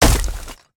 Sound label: Dash Impact; Caffiend